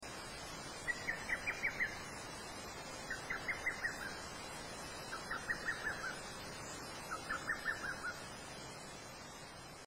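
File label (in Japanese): hototo, 野鳥の声; ホトトギス; 夏には毎日鳴きまくっています; 夜中に鳴いていることが多い; 鳴かぬなら…で有名ですが、実際には、夏には毎日鳴きまくっています。 鳴き声も独特ですが、何と言っても、人も鳥も寝静まる夜中に鳴いていることが多いことが特徴的です。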